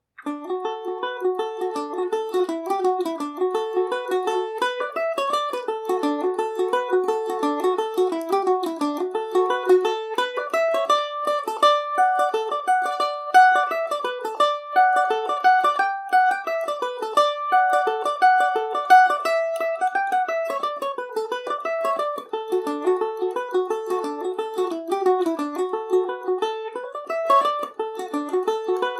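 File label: doon revisited D major, mandolin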